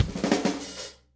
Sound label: 102 Rim Grooves, Pop Rock Drums, 102 bpm